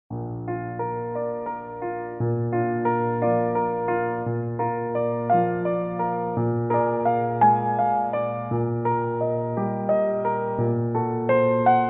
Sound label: Ave maria playback